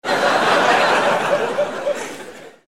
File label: laugh